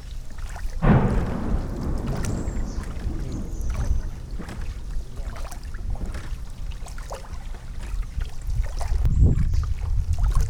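jungle before storm with water, Jungle Stream before Storm; field recordings; Vietnam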